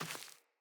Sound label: Sandals Run Vegetation, Footsteps; Player